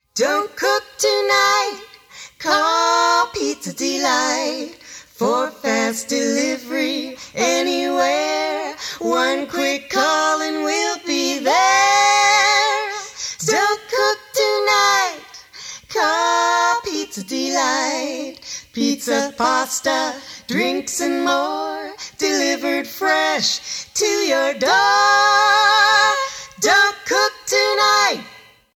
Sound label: Jingle; Pizza Delight